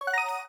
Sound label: combo